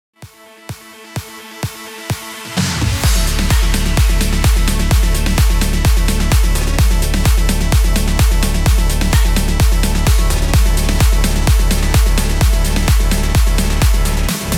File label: progressive, 128 BPM; PROGRESSIVE CUT EDIT